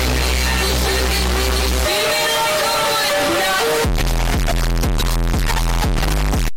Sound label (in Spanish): musica de sigma saturado